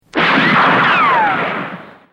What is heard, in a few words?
bullet ricochet